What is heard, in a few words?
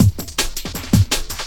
Limiter 162, breaks